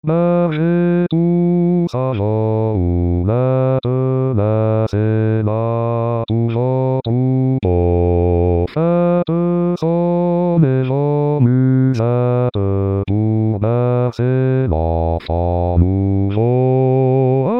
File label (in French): Ecoutez la voix des anges Basse, Chorale